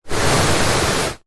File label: household dustpan brush sweeping carpet single long slow sweep; zapsplat